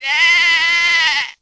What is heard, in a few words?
Sheep Bleat; Civ4 warlords; Resouces and Improvements